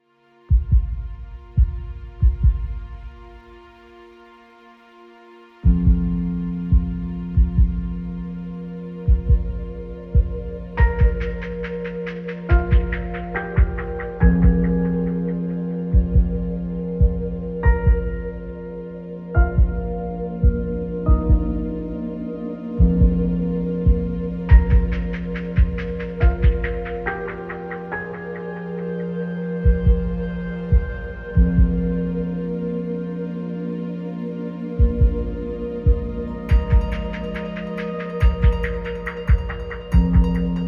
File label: Rain Trees